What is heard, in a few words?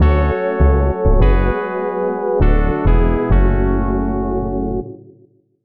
Failure Theme